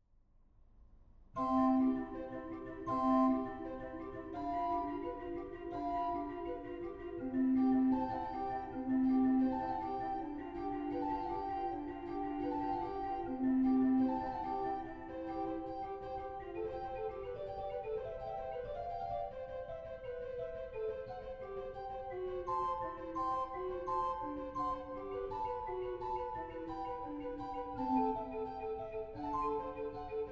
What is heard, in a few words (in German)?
Floetenuhr, Allegro moderato; organ; Flötenuhrstücke; goenn; 1844 Franz Xaver Engelfried Organ; Joseph Haydn (1732-1809)